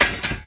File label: grab sword